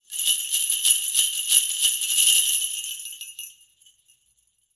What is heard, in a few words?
sleigh bells